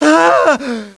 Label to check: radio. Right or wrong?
Wrong